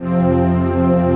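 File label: chord pad, Pads; Best Service; XX Large - Pads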